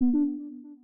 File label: connected